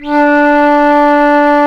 flute, C flute

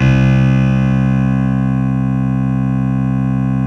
Ele Korg C2, Fairlight CMI IIe

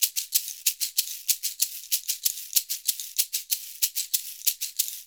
SHK, Shaker; Univers Sons; Basicussions

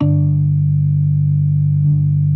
B3 G 2; Best Service; Hyper Dance

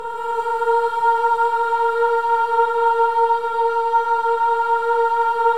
BOYS AHS, Symphony of Voices

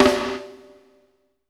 FLAM VERB, PROC SNRS, Drumworks; Sampleheads; New York City Drumworks VOL-1

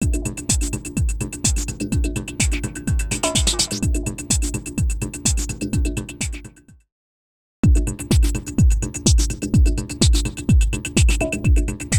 LFO 126bpm; X-Static Goldmine 5

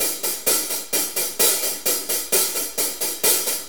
4-4 HiHat Loop; Drum Tools

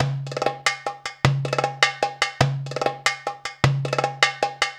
PERCLP, ArabianTraditions; USB Soundscan vol.43; AKAI

